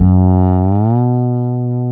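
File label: SLIDE F#-B, FRETLESS BASS; Northstar - New Gold VOL-2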